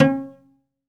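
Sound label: SOLO CELLO, Synclavier Strings; ILIO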